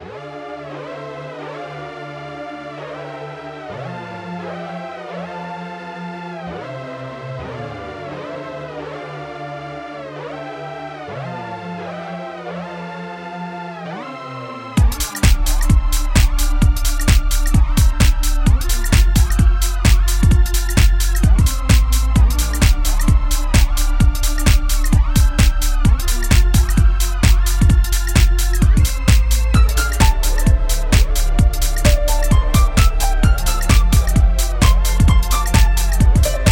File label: helium dance loop